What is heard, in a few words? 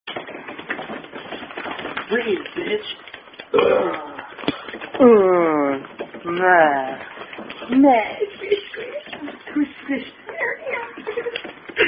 Signs Indian Drinking Game; Thumper Game